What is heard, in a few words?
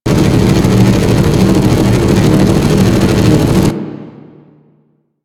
Small Fan; Warframe; Ambience; Clan Dojo; Dojo Misc